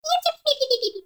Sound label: baby vocal tired; Warframe; Nokko Colony